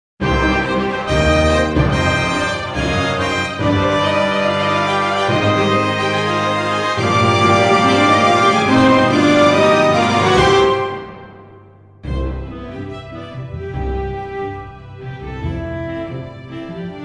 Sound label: Victory Theme; Regalia of Men and Monarchs